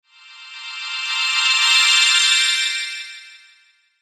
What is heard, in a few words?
Pixie Dust Crescendo Shimmer Delay; Magic; Blastwave